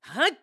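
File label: Jump Attack, voice; Link; BOTW; Breath of the Wild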